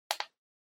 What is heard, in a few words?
Handheld Transceiver Button